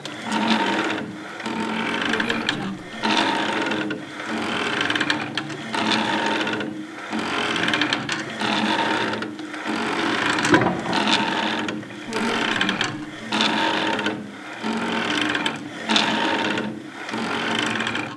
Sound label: person exercising on shoulder press; stormwaveaudio